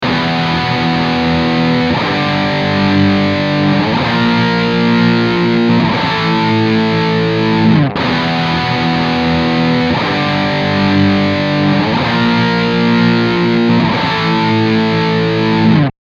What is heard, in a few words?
NECK drive II, eclipse active line6